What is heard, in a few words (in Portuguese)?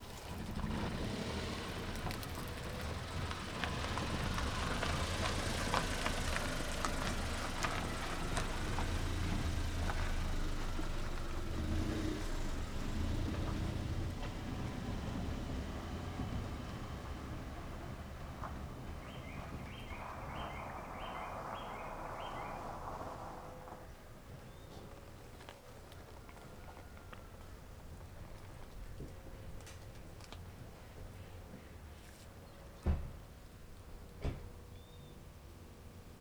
Carro devagar em estrada de terra com estalo de madeira, estaciona e bate; maquinas; porta; colecao sonora do cerrado